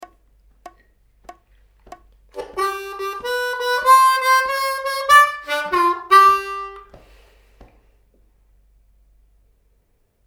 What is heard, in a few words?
Fun Shuffle Licks Lick, harmonica